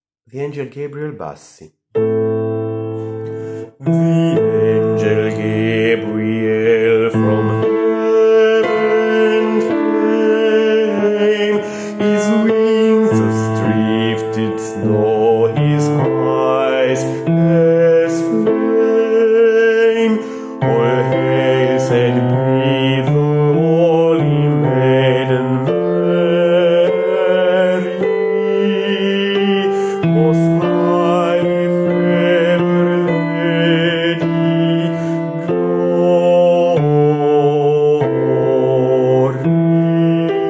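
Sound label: angel gabriel bassi, coro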